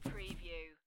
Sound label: Playing Cards Tap Deck Of Cards On Felt